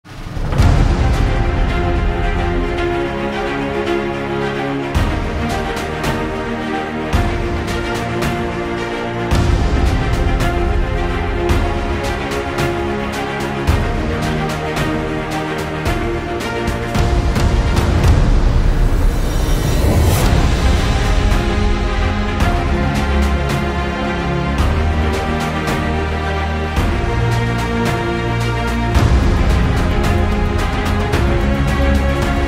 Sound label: Epic Background Music for Videos